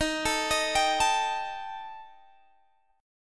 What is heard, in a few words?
D sharp Major Flat 5th D sharp Guitar Open G, chord; x,20,19,20,20,19; chordshape